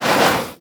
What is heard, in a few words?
body slide, antlion; creatures